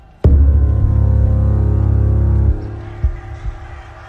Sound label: notification